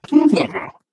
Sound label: ballbot do it